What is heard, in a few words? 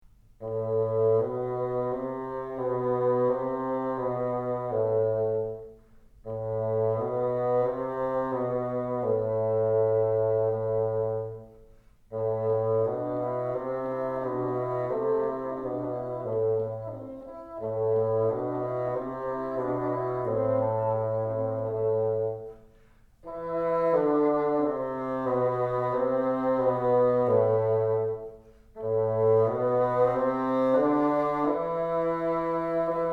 together, bassoon